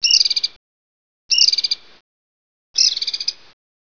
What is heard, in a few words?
western birdchirp